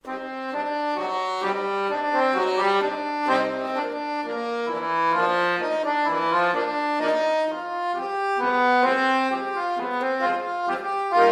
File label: Tango; Nordic; 40s